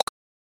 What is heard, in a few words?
click; misskey; drop-and-fusion